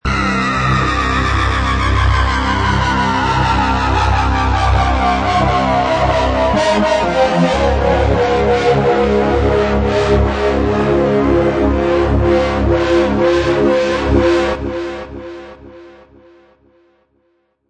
Evil Surge, High quality thriller-horror ambience sound perfect for building tension; in film-game scene; Scary Tone; High quality thriller-horror ambience sound perfect for building tension and drama in film-game scene